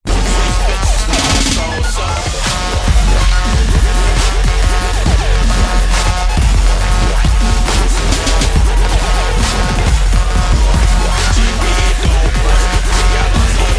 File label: beat